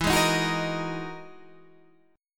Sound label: E Major Sharp 11th E, Guitar, Standard; strummed; JGuitar